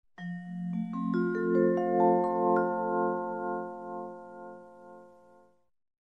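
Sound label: sounds with effect of completion, ending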